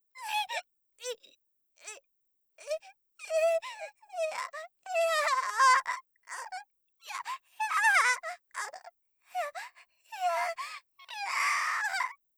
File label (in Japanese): ひぃ！; 効果音なし